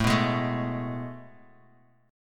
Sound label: A Major 7th Suspended 2nd, Bass Standard; AM7sus2 strummed; JGuitar